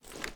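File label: first raise movement; gmod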